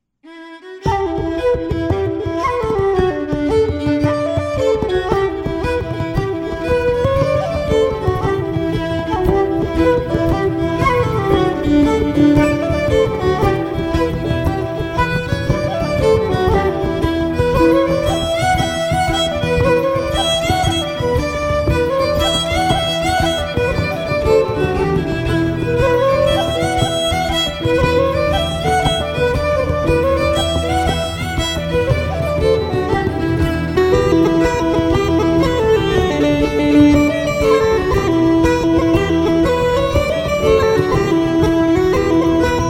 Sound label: swallowtail jig